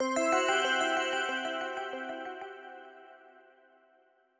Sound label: Title Screen Jingle; Chrono Gear Warden of Time